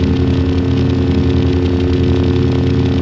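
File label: beam loop; cairn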